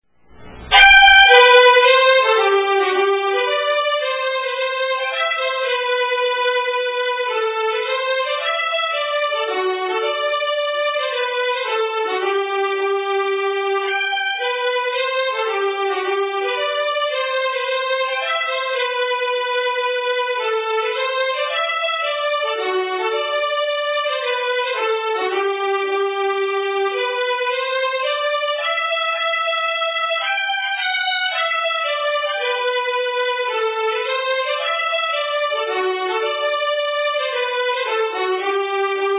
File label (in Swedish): Hambopolska